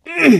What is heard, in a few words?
launch pain; elivance